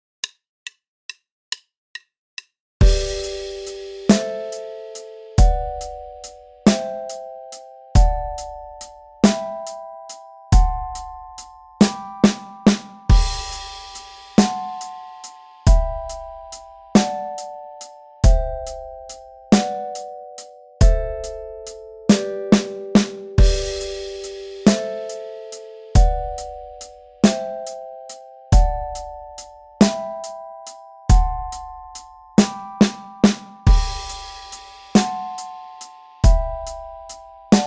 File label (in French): debutants harmonie accompagnement, basse